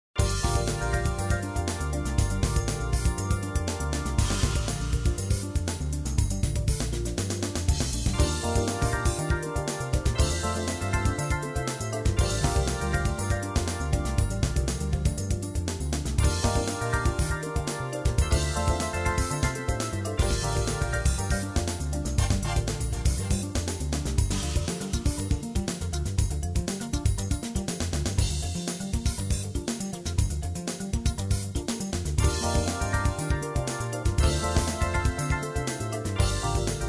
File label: Groove One